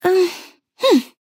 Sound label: Nonverbal Encourage; Aurora